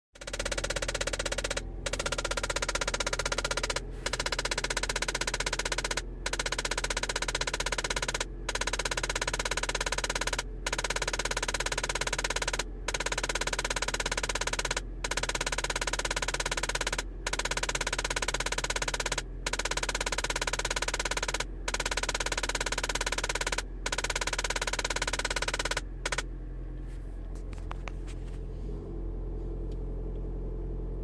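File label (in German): Tackern Klimaanlage Prius 4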